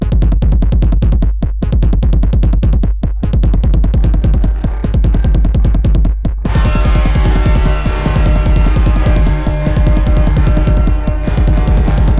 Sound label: mars music; Alien Arena